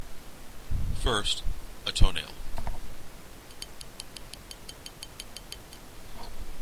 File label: tap it